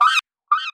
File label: birds